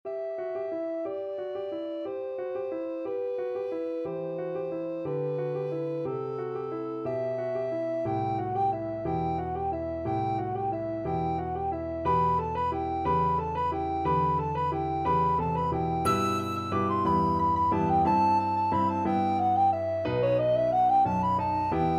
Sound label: carol of the bells, alto recorder